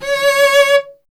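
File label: strings cello C#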